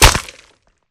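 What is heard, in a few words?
Bullet Light Ballistic Concrete impact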